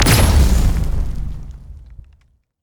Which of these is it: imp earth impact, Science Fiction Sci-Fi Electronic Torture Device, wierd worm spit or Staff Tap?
imp earth impact